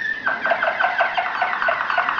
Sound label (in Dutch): tjokken